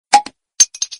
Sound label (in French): son notification decapsuleur